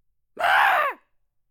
male death

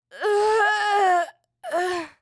female death, human

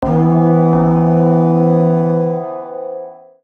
alarm alert; interface

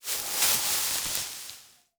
sel tree

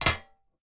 arrow metal, attack impact

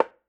sling wood, attack impact

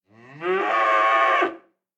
cattle; fauna

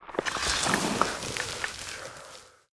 gather field expire

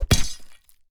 sword attack

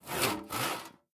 construction saw

